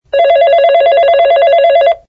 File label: Ringtone, ringtones; BLU; Studio-XL-2